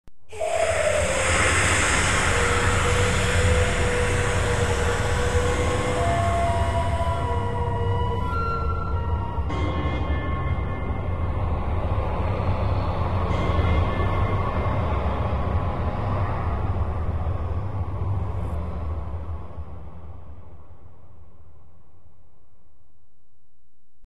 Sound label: Undead Defeat; warcraft